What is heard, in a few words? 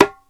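perc darabuka; Roland LCDP14 Africa VOL-2; Af. Hand Drm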